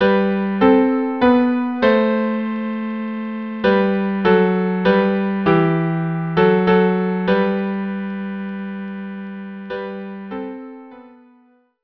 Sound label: viola viola or viola cello duo; Finlandia hymn